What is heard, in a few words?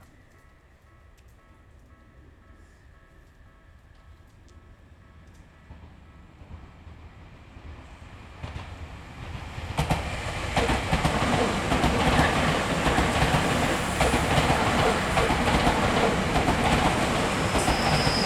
train